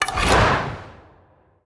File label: Wand Dark Play Underlay